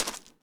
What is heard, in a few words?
gravel step; Golden Crayon Sounds